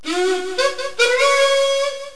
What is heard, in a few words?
kazoo